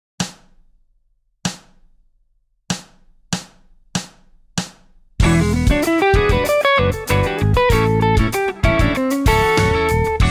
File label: funk solo; 小指の運指を活かした譜例; ロック; ヤング・ギター８月号